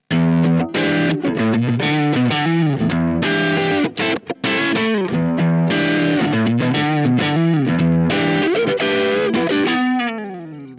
Schecter Breakup Neck to Bridge Splitted Humbucker; Rob Scallon